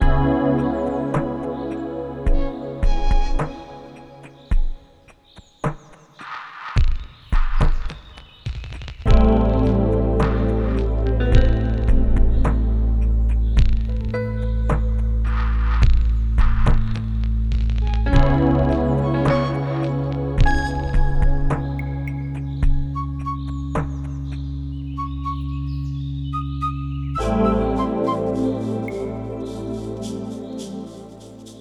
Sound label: CALM music